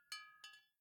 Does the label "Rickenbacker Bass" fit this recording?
No